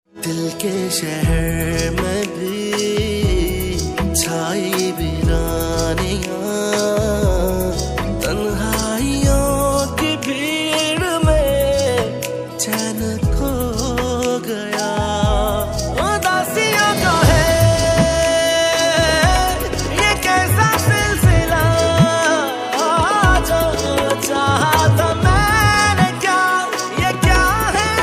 Ost pakistani ringtone

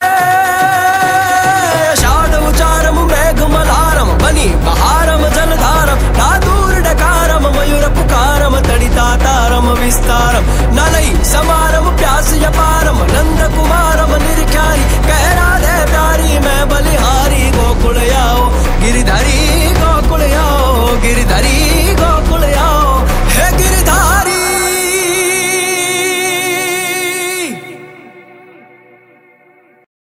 Gujarati ringtone

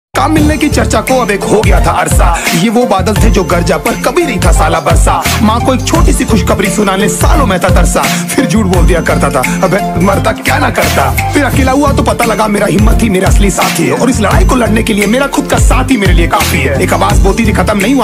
hindi rap ringtone

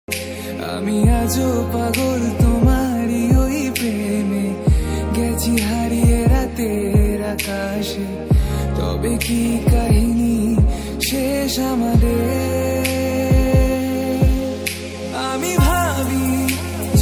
bengali version bengali ringtone

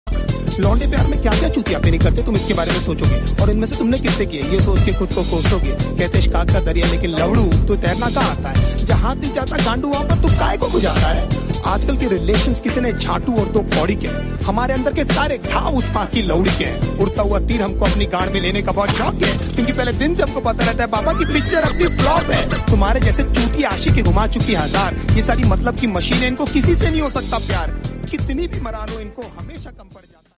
hindi rap ringtone

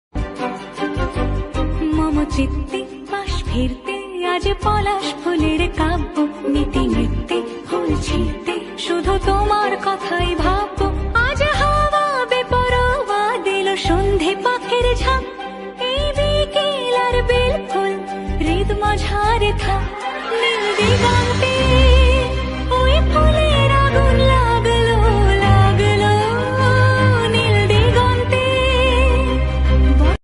bengali ringtone

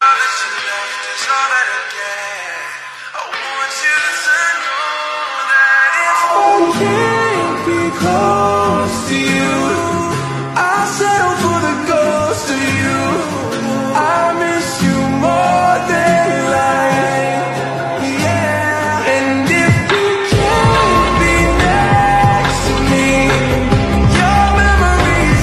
slowed english ringtone

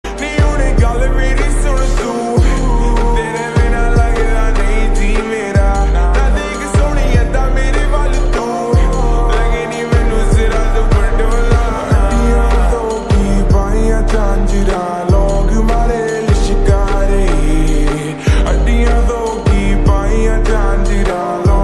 lofi punjabi ringtone

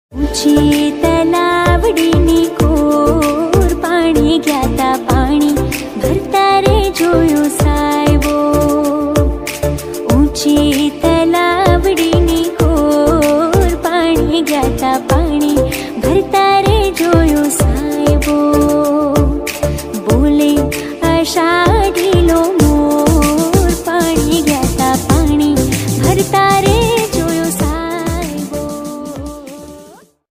gujarati ringtone

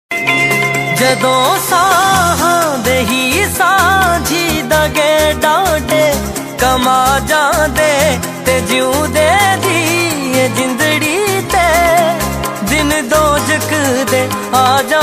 punjabi ringtone

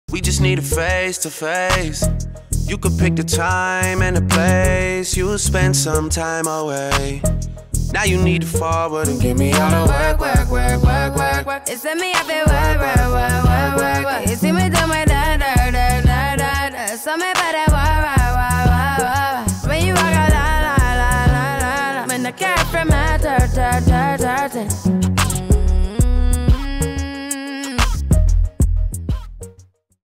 english ringtone

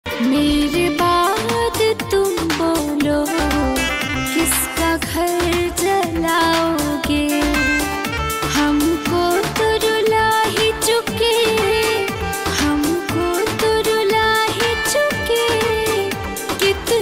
bhojpuri ringtone

